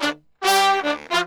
brass 110 G, brass murga; Best Service ProSamples vol.14; World Grooves; AKAI

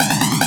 drum; Optical Media International; Sonic Images Library; SI FX Vol 9

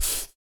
SLOBBER; Spectrasonics; Planet

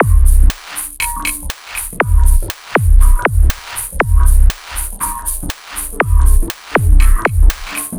Hip-Pop Future Beat, Drum Loop; Sonic Foundry; The Electronic Music Manuscript Collection; Drum Loop Structures

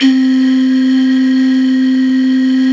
FLT BOTTL, Bottle Flt; Club-50 - Foundations Roland; Flutes +

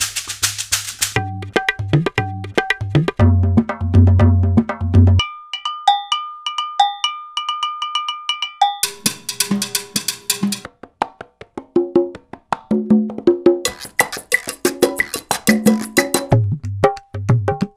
Roland BK-5 Loops & Phrases

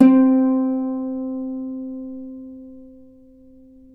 Celtic Harp, Plucked Strings

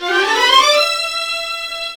STRING LICKS, Disco

